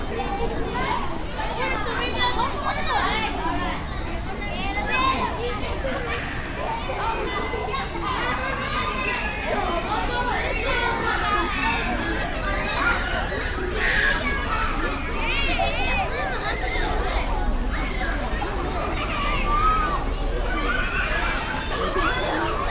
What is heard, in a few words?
many young kids